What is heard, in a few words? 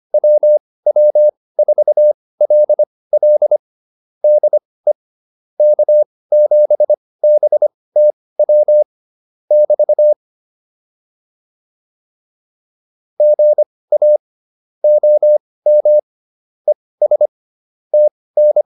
QSO, intermediate practice files